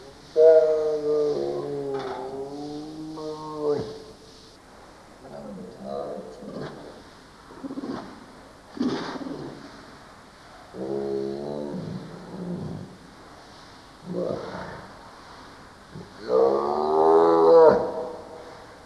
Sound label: Panthera tigris; tiger; Njama; A young white tiger is talking with his keeper using various utterances